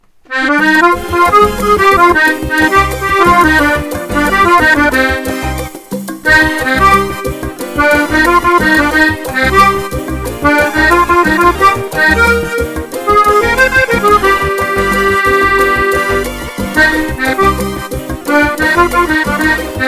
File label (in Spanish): mambo duo